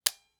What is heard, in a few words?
camera shutter release button; mechanical